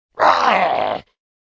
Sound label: Mob die; Monster; 흑수사; 잡역부